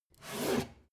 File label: take book hard